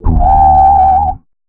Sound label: Gravity Switch; DoughnutQuest